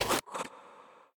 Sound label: Bow Hit; we are the dwarves gamerip 2016